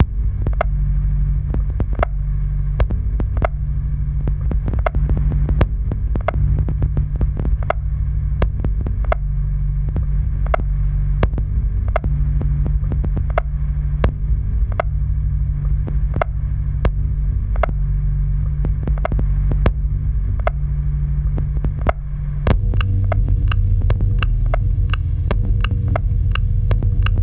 s1000 noise

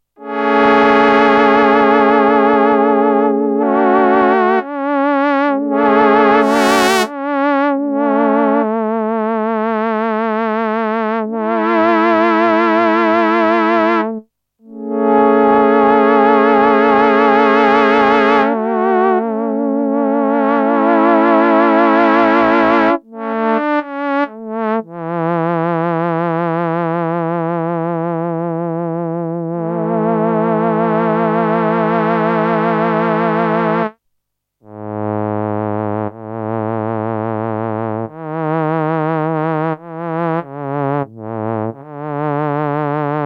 jupiter-4 solo

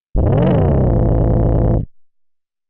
engine

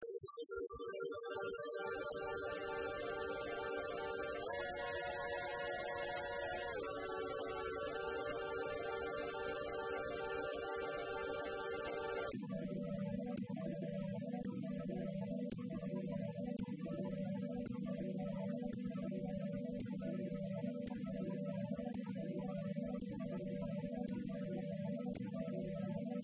microKORG SDD-1000

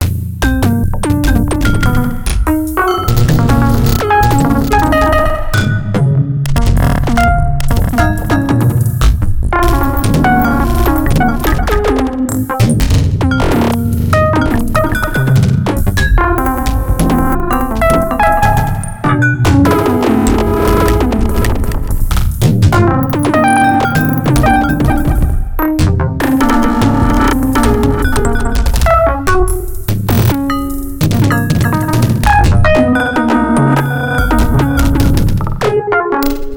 nord Drum 3P

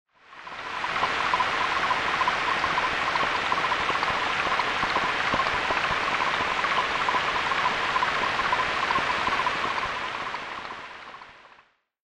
rain

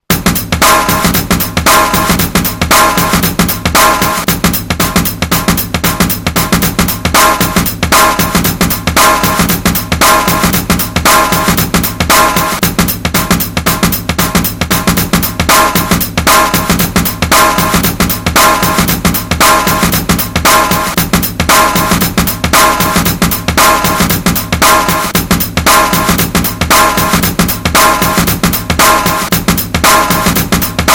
s612 drum

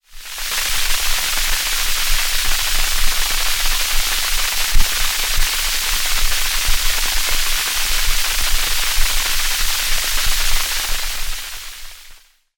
rain

